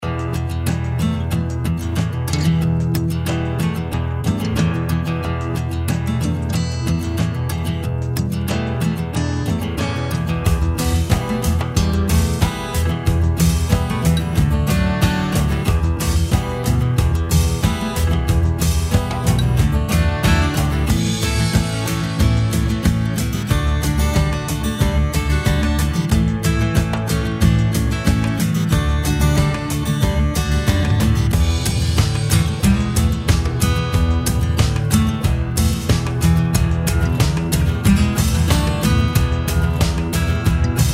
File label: FOLK POLYNESIA PEOPLE